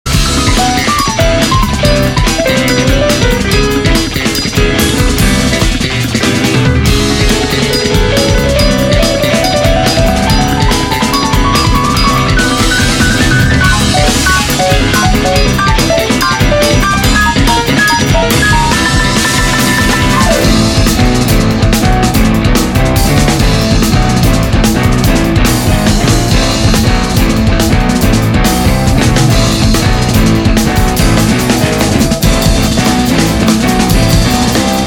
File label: clock pulse